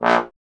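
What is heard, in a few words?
bass trombone A#; Miroslav Vitous; Solo Instruments VOL-1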